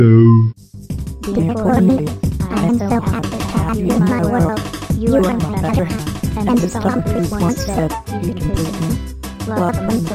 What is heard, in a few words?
Funny Ringtone